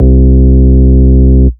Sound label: Ultimate Bass Root C; Vengeance Samples